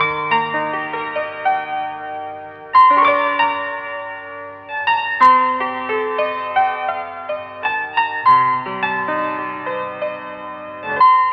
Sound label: piano, sad loop